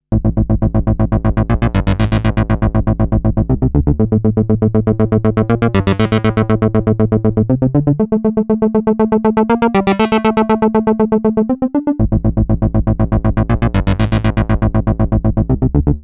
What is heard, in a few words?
synth